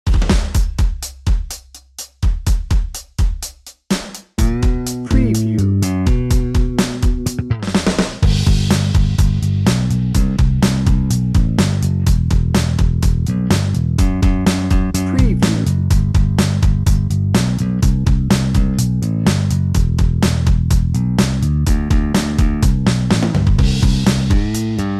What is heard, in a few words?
americana soft rock